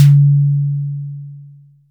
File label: SD decay; Sonic-Core EDS16-V2